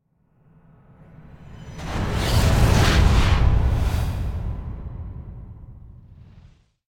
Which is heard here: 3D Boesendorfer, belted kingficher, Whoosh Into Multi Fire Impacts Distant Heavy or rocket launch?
Whoosh Into Multi Fire Impacts Distant Heavy